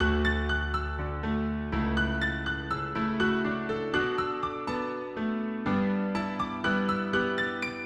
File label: Dmaj 122BPM Tropical Grand, Music Loops; 99Sounds; Monosounds; PANDORA BOX!; Coconut Coctail